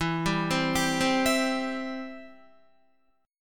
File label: E Minor Sharp 5th Guitar; Em#5 arpeggiated; JGuitar